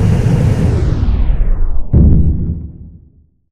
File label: aircraft stop int; Original TK Sounds